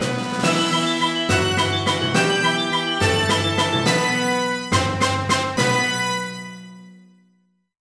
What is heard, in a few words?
Music Sting Extra Ball, pinball